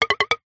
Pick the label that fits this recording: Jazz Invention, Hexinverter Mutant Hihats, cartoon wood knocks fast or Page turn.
cartoon wood knocks fast